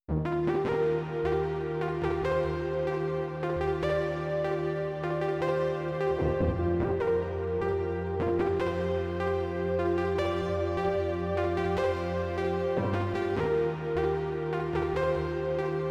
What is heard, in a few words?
hydrasynth